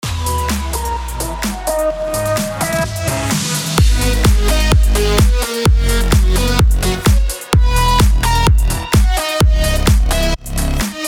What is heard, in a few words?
BED ELECTRO HOUSE G#MIN 128BPM